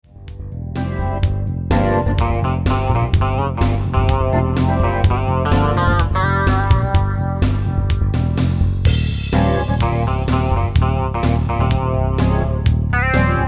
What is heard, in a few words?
pop rock